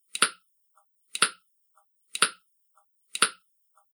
Tension Timer Loop, electronic; Looping; Fantasy, Horror And Sci-Fi Games

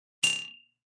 Clickie; Clicks; Multimedia

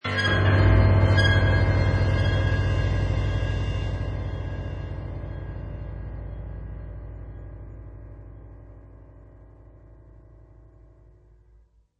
Scare Piano and Metal Stinger; Scary horror piano sting with sliding metal screech. Deep piano fright sound.; Scary Soundscape

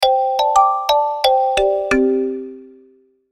Logo Marimba Melody; Sonic Logo Sound Effects; Production Element Imaging Element Accent Transition

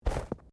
Body movement Slide

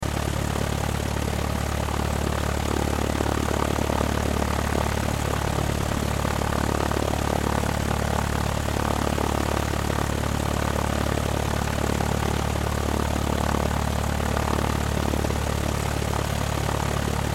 Plane Idle Propeller Loop; Transportation Aircraft; Airplanes; Vehicles

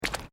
Footsteps Boots Asphelt Puddles Step; Footstep Foley; Footsteps (Sound Effects:Human)

